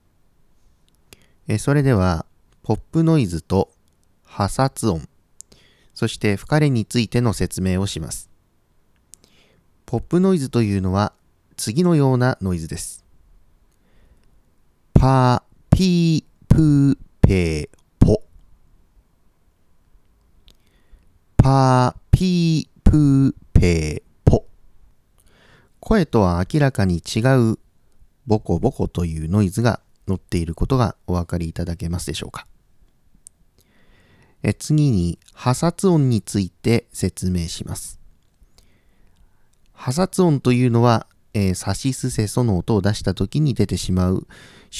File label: noise